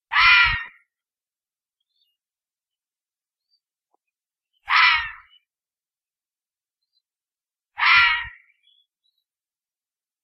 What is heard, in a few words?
red fox screams